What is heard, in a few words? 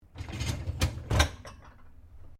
Dishwasher Top Tray Loaded Close, Household dishwasher; Dishlex; Appliance; Appliances